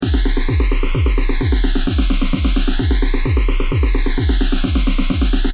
drum loop phase 100

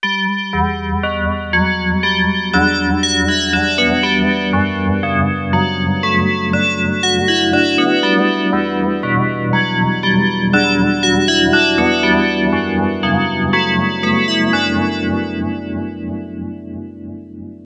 DX7 bells, pigtronix

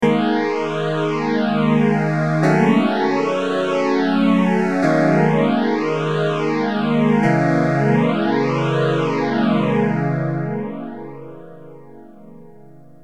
m1000 phase100

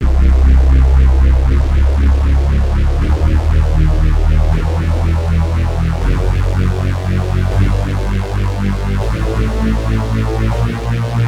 octascale stereo polyphase

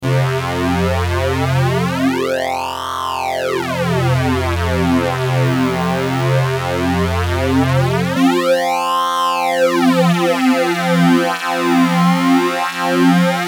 ada flanger

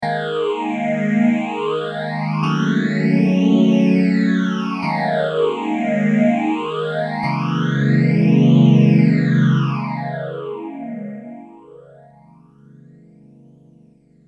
m1000 boss ph3

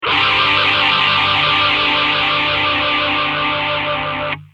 gtr ibanez ph7

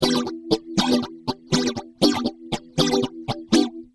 rhy gtr mutron biphase

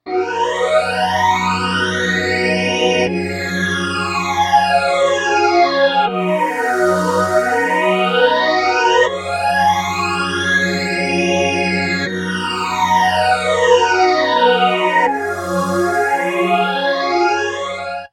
Stringtron, Moogerphaser

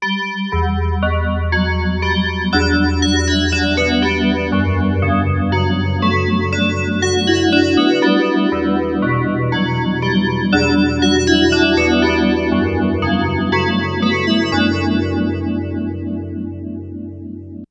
dx7 bells pt9 pro

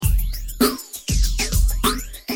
drums biphase; mutron

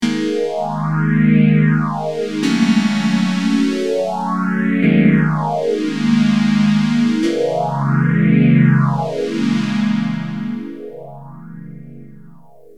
m1000 nady ps019

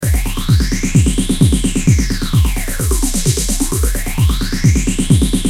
drum loop Mutron phasor II

